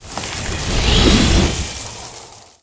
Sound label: ice guard; archer